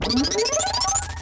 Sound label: bleep